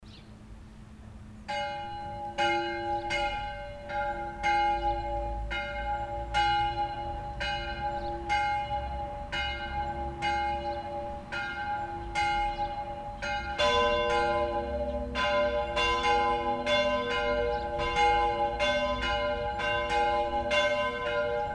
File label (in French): collegiale plenum 9 cloches; romont